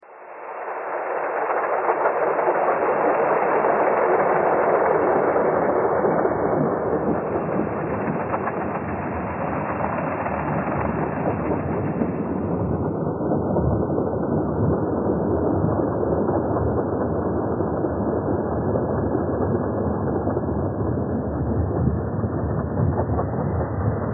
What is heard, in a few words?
thread harmonic